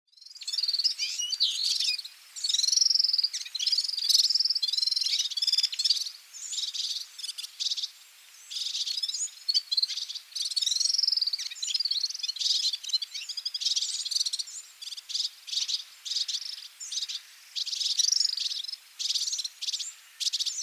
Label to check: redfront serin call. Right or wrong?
Right